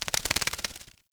Night Creature Close; Ambience; Night One Offs

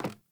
Sandals Run Wood, Footsteps; Characters; Player

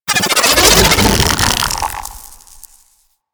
Shock Swarm Projectile Transform; Warframe; Infested; Shock Swarm Rifle

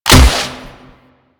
Hunting Bow Fire 3D B; Warframe; Weapons

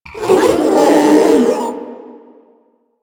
Proto Infested Ancient Strike Scream; Enemies; Abilities; Warframe

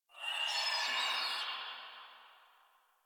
Tree Distant Critters Happy Calm, Ambience; Warframe; Duviri

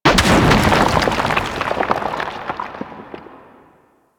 Moon Rock Fall Medium A; Ambience; Orokin; Warframe

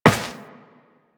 Step Metal, Footsteps Walk; DE; Warframe; Tenno

